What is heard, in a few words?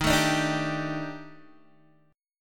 Eb Major 7th Flat 5th Eb Guitar DADGAD